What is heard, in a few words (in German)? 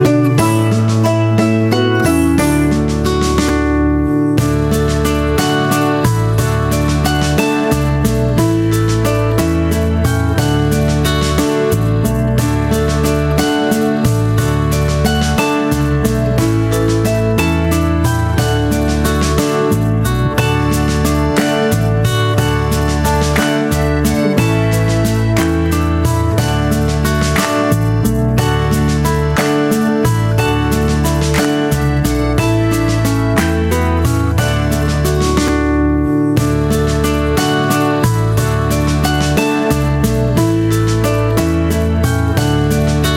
Instrumental